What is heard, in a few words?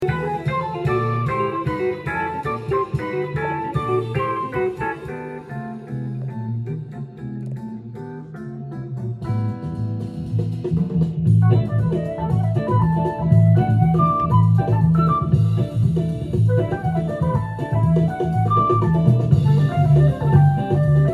Jazz Quintet